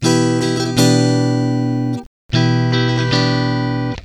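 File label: speaker sim marshall clean; spksim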